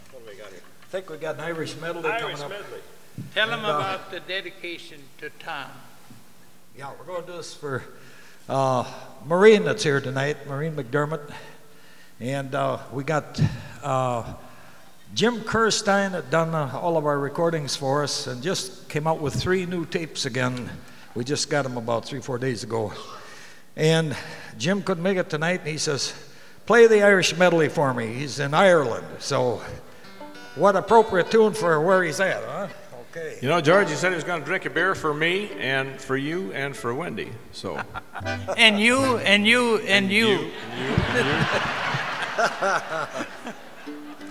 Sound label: Irish Medley